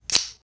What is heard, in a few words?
button